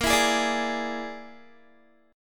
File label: Bb 7th Flat 5th Bb, Guitar; Bb7b5 strummed